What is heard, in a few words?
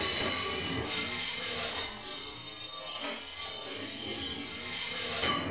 Flange, train; R110B; OpenBVE